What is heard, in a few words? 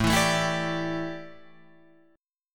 A 7th Suspended 4th, A, down, Guitar, Open F; A7sus4 strummed